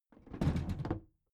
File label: big plastic case lid put down soft